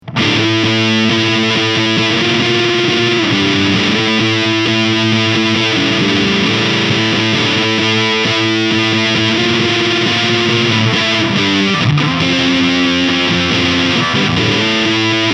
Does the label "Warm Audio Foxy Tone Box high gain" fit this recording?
Yes